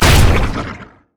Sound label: crit attack; Zac